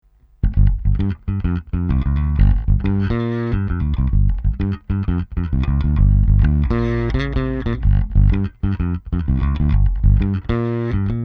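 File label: Scrambler II Finger, bass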